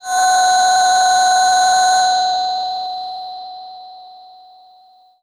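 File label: Breath DX100 F sharp 7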